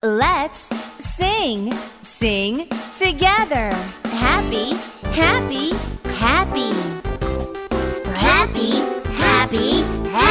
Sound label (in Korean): 영어 여 챈트